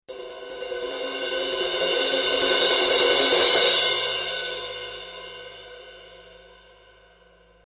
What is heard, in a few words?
Sabian 16 HHX stage crash